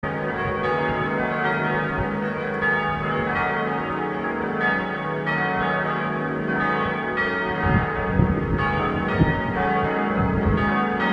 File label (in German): glocken